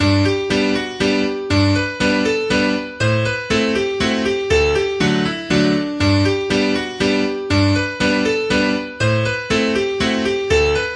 Mazurka

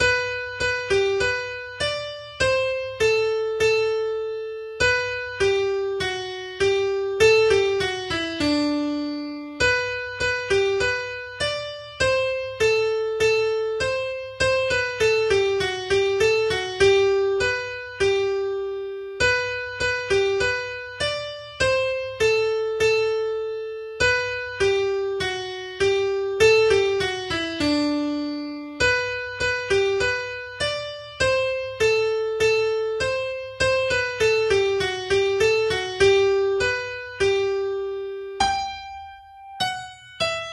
slow Quickstep; Louth Quickstep

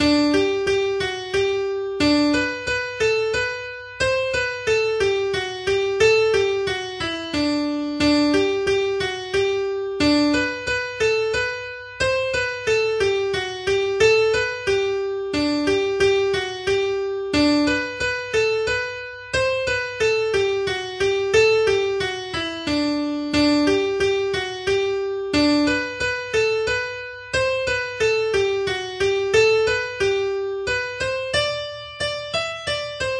little slow Mazurka Auvergne

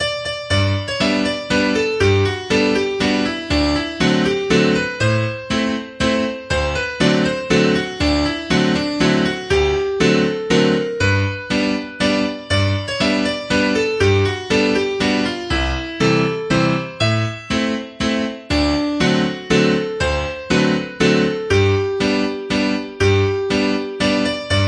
Waltz; Teatree Waltz

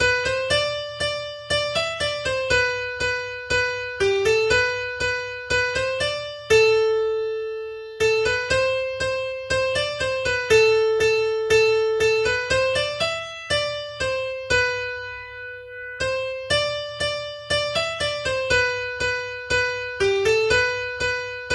little slow Polka; Tip Top Polka

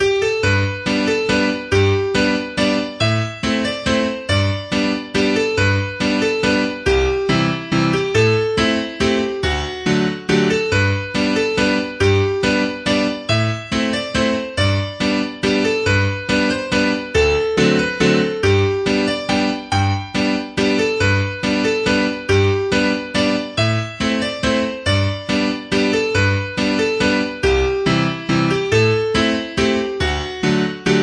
Waltz, in the key of E Major; Guinness Waltz; AltoSax

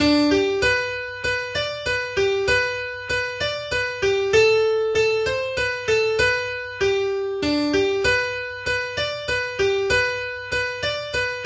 little slow Mazurka; Mazurka De Lapleau

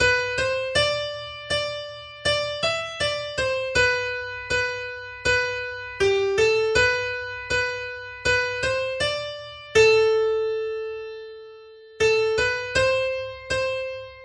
slow polka; Tip Top Polka

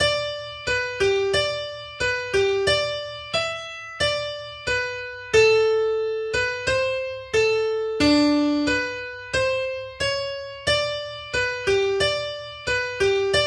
slow Exploding Potato

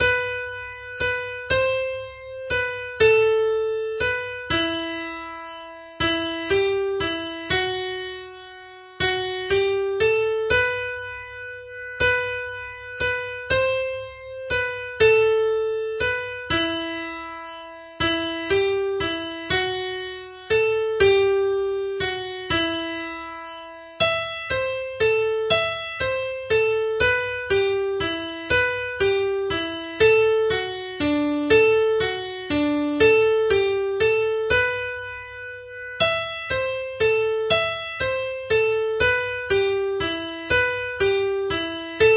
little slow valse musette

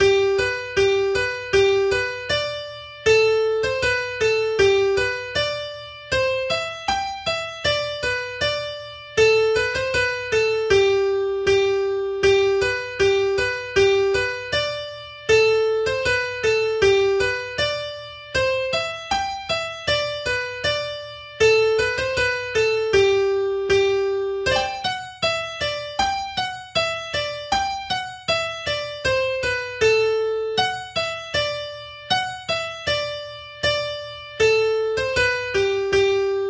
little slow gallop; Winster Gallop

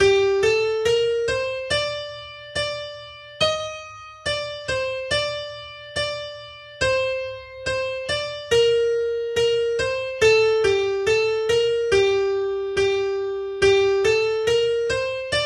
slow; Italian Rant

